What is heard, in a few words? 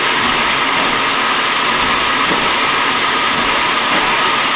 piston; Gunlok